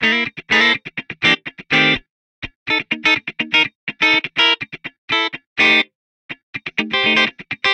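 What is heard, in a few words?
Guitar Funk C major 124bpm; Guitar and Bass; Ableton; Factory Packs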